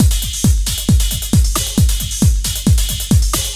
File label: Drums Techno